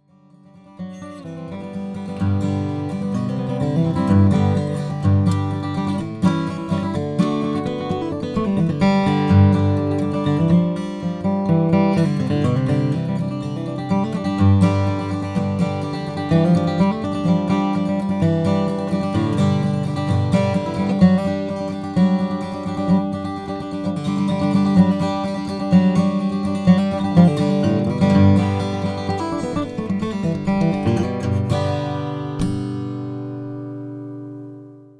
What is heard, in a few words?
Collings D2HG German spruce top